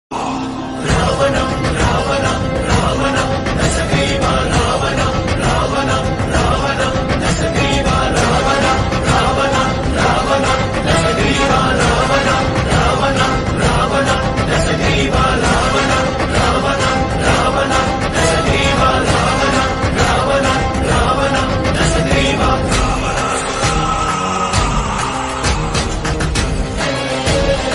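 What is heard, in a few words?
movie ringtone background music ringtone; ravanasura glimpse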